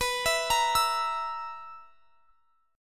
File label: B Major 7th Suspended 4th B down; arpeggiated